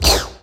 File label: imp shoot